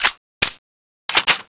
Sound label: clip in; tfa cso; vulcanus3